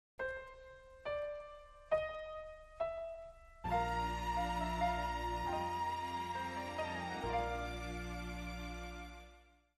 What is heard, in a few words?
No Drums & Guitar